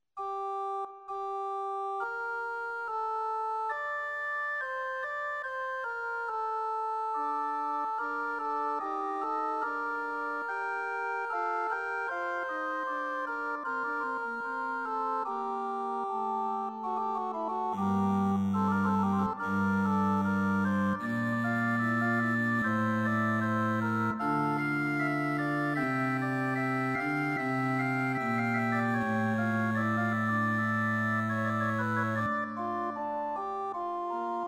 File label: Basse De Cromorne, organ; Pachelb; Warum Betruebst Du